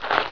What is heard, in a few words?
8 FSTEPS